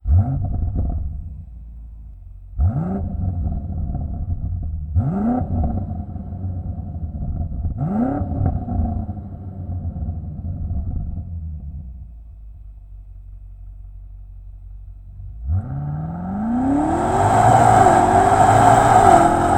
BMW M8 Serie; Aerotechnik

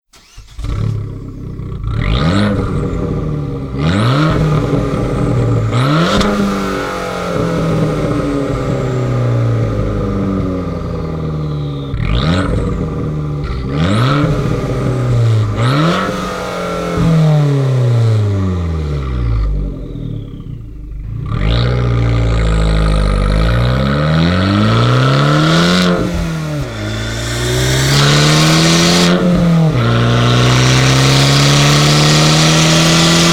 Fiat500 Abarth Serie; Aerotechnik

